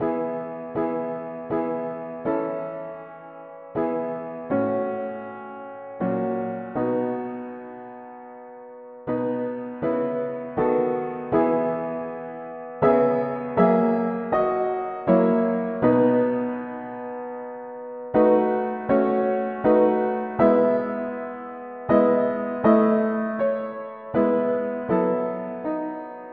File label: mono piano, hymns; Sur tes promesses Dieu d amour